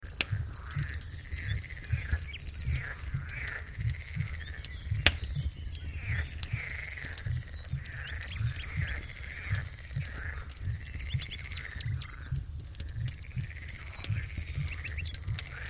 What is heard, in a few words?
nature; sacred fire circle